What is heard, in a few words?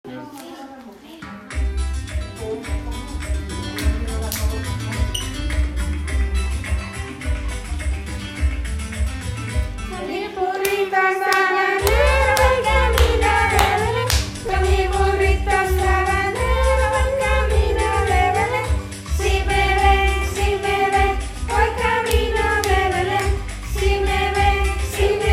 Christmas Song